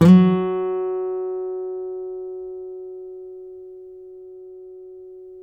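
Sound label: nylon, FLAMENCO, Guitars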